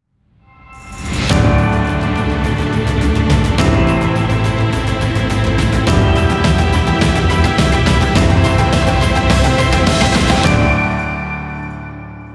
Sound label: Epic Inspiring Logo